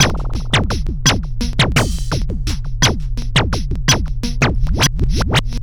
Drums Strange

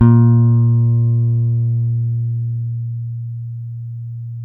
A#1 JAZZ GTR; Univers Sons; Jazzistic

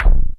PULSE KNOC, TECHNO RAVE; Pro-Rec - Synthsonic

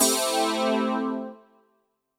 CHORDS; EastWest; Dance Mega Hip Hop Electro

